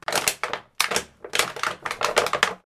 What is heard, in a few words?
Plastic bottle crush